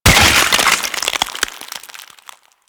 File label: Ice Hammer Impact, weapons